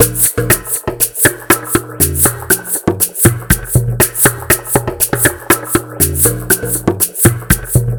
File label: Ethnic Drum Loop; Sonic Foundry (Sony Creative Software); The Electronic Music Manuscript Collection